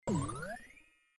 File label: powerup pickup; Subway Surfers